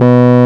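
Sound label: RMI piano B 1, EP; Sonic Reality - Vintage Timetraveler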